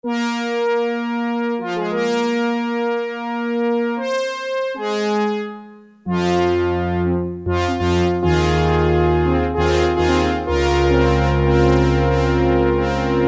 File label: HI BRASS, Roland Jupiter-8; Factory patches